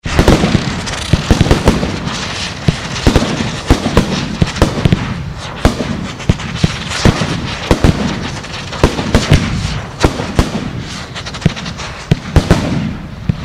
Fireworks Pyrotechnics, Huge display with close shot bangs; Huge display with close shot bangs etc - some background music